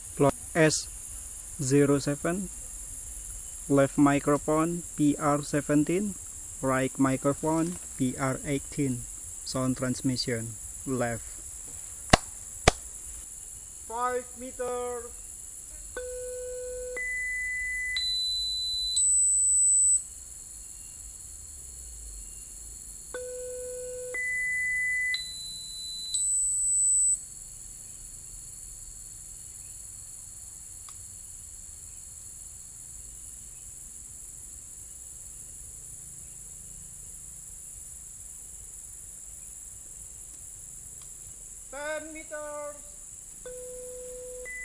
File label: birds